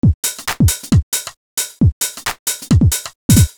2 step loop 134bpm

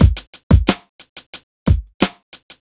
90 groove; funk loops

